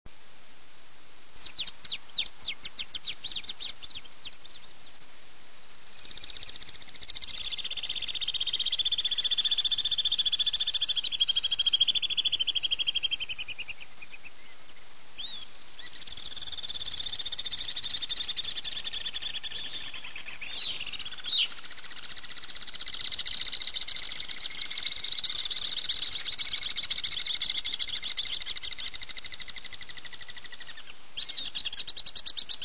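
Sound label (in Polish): jerzyk alpejski, głosy; jerzyk skalny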